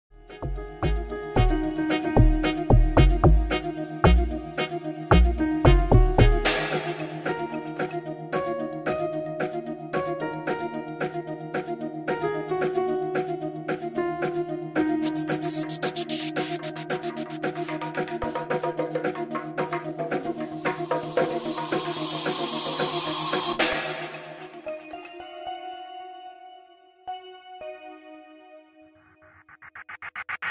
Amapiano

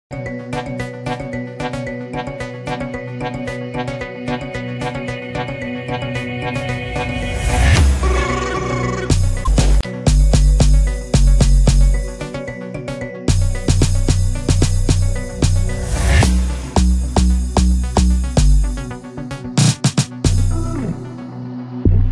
Quarantine Mix Amapiano

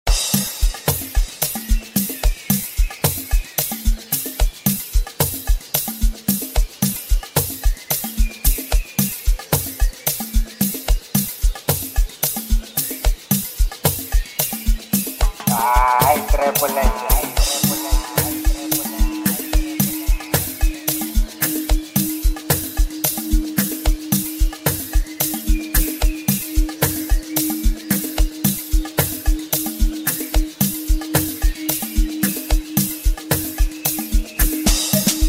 Forest Peace Jungle Mix

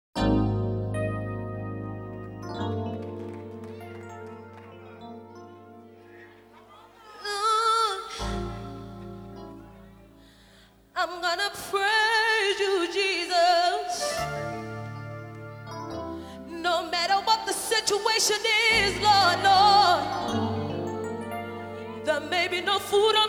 Worship Opening Song

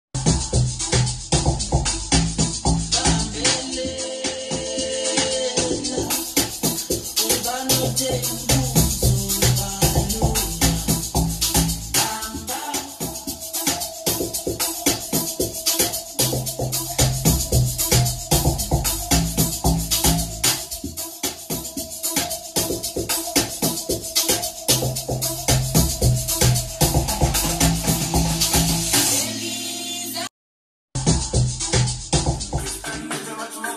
house party

